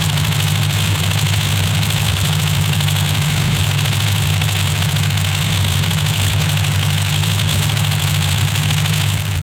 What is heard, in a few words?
futuristic ferrari inspir, racing